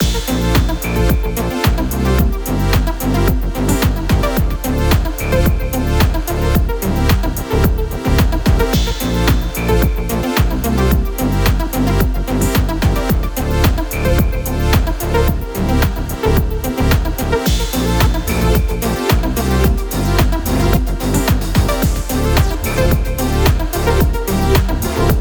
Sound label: music